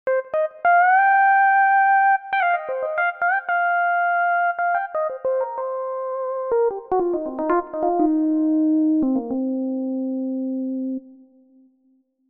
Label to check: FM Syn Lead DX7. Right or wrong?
Right